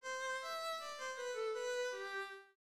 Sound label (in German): Rhythmus Ubungen; Notenlesen lernen